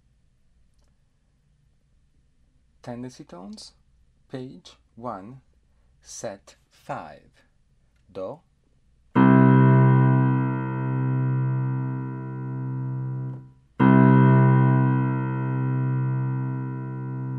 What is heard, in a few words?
tendency tones